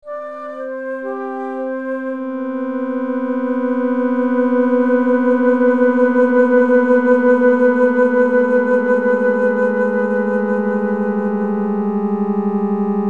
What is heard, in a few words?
panning special effects, chiptunes